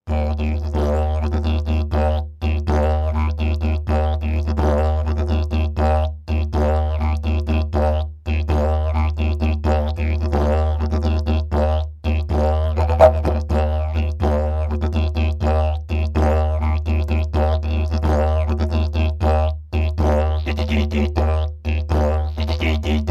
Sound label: didjbone